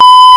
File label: ALARM; eCS 1.00; MMOS2; OCEAN